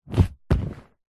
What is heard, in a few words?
household chair sofa couch leather body limb down press against creak; zapsplat